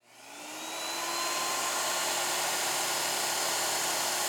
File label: hoover Start; Singles; French Version